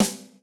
Snare, Yamaha RY20

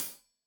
Hat Tight, Hi-Hat, Cymbals; Deeply Sampled Drums

